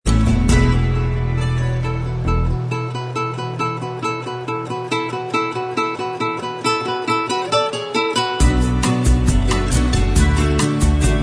bossa style Inst Track, backing tracks

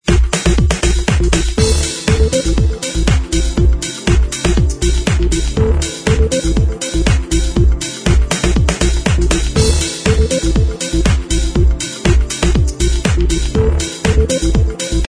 Inst

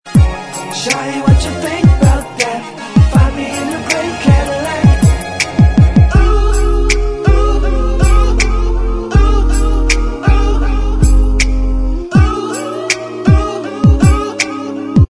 Bvox

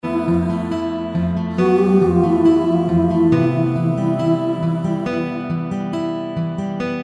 B Vox

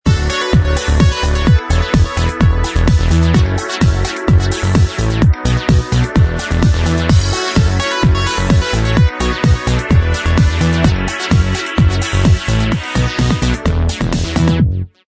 Inst Track

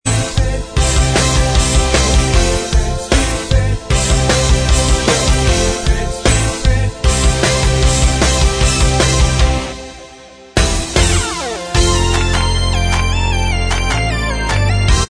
Bvox Track

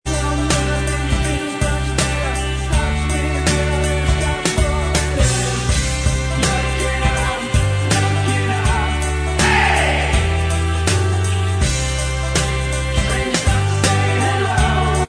Bvox

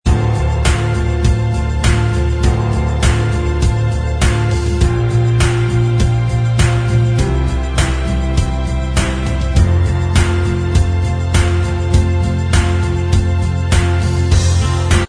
Inst

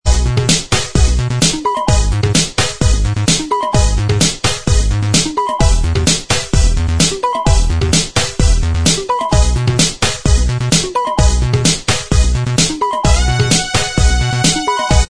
Inst Track, backing tracks

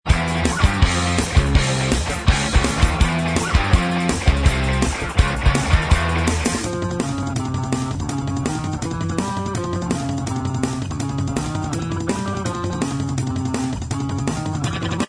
Inst Track

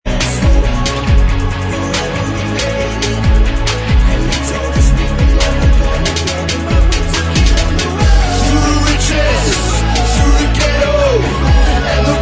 backing vox

